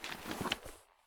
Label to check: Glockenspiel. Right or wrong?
Wrong